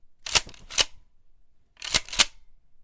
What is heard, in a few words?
Pump Shotgun 2x